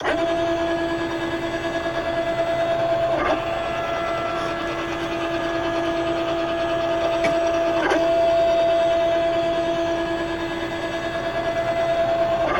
Industrial Robot First Axis Rotating Medium Front Mono; Justsoundeffects